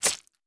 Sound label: Stab